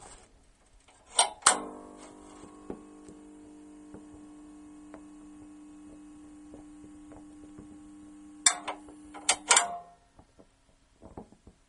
amplificador

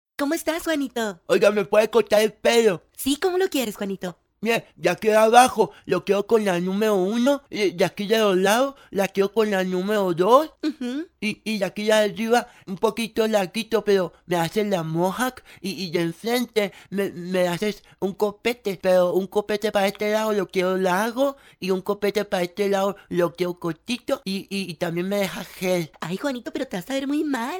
cortar el pelo

